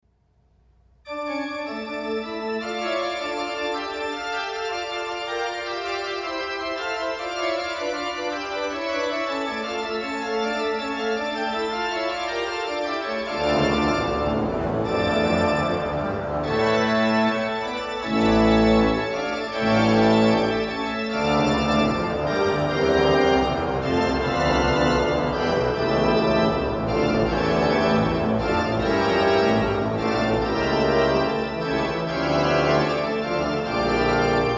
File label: Bach Toccata In D Minor, organs